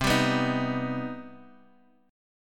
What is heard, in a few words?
C Minor Major 7th C Guitar DADGAD